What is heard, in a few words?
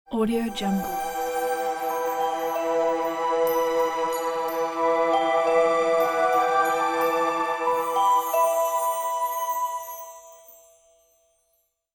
fairy tale intro stinger